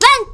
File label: count 1s; osu!; Retronix 2.0 HD optimized